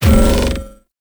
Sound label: Weapon Loop Stop; Warframe; RailJack Weapons; Modular Weapons